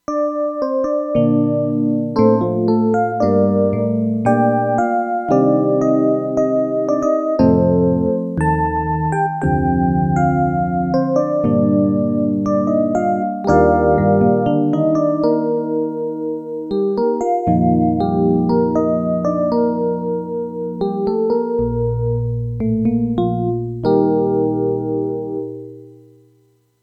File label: rhodes